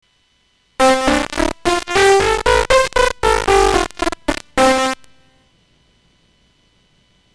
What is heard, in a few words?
c major scale, digital piano